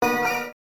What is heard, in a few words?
Coin Sound; arcade; gamerip; Bakuretsu Quiz Ma-Q Dai Bouken; namco na-1